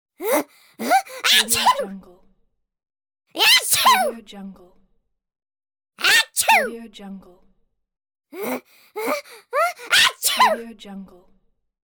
cartoon character sneezing

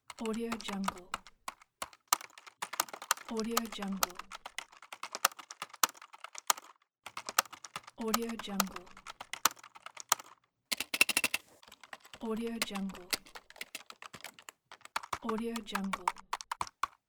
keyboard

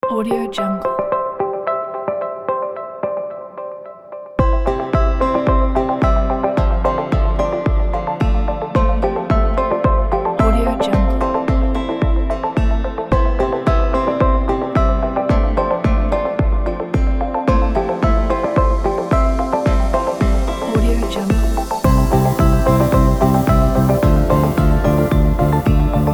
motivation uplifting kit

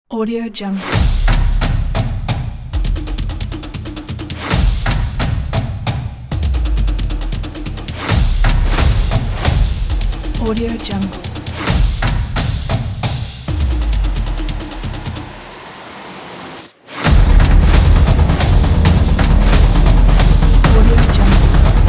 soccer

